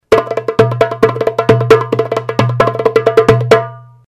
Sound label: Drum; ceramic drums